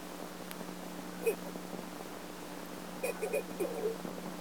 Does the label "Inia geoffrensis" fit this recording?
Yes